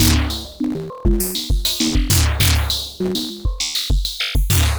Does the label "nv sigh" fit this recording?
No